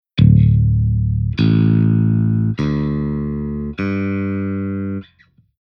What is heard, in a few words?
Bass Tuning Drop D